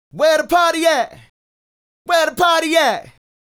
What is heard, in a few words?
Male Solo, Hip-Hop and RnB Vocals